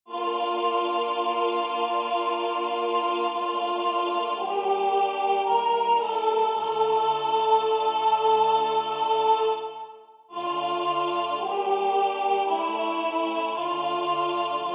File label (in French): Iste est panis soprane; Gauthier